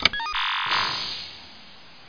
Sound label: wls panel on